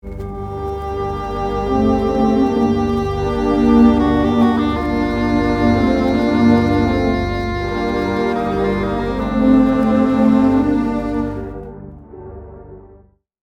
3 Layers, a melody harmonized in thirds (violin I and viola), a counterline (english horn), and harmonic accompaniment (low strings); Rule of Three